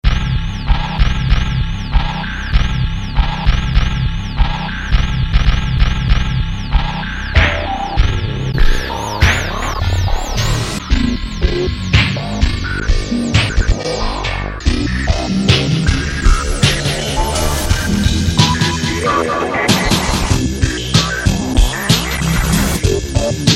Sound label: Mangling Patterns